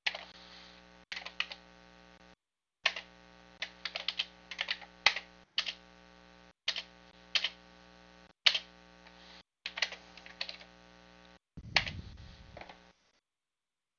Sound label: click click click